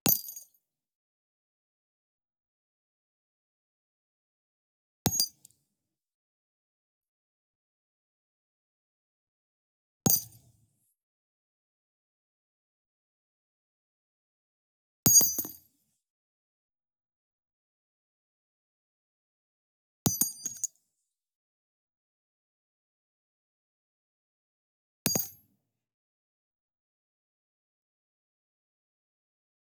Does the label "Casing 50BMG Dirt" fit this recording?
Yes